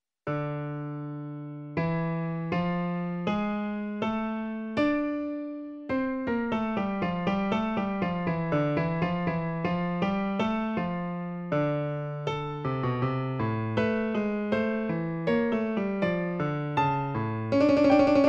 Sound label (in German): Doppelfuge; Thieriot